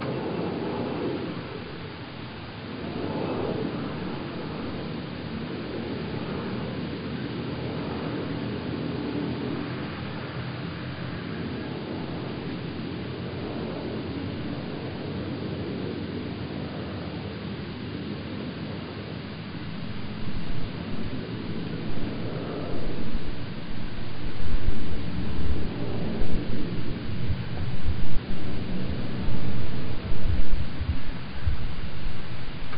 jet coast

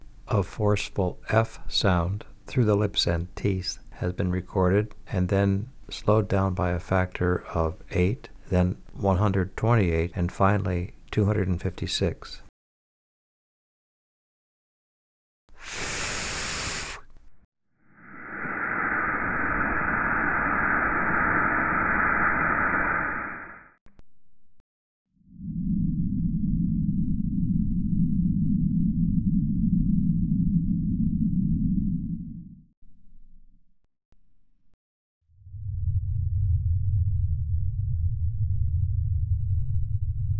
loud f sound

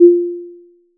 overlapping

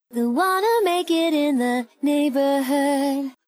Neighborhood

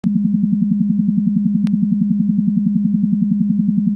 10Hz one phase shifted

